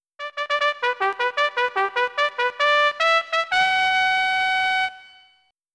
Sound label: Trumpet; Hey You, Pikachu!; N64